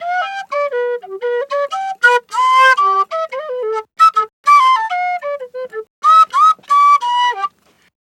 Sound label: Penny 98 bpm G, Penny Whistle, African